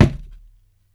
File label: Foley Mid, Drum Bits, Sweeteners; layering